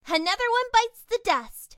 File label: Finish Level